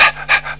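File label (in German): hecheln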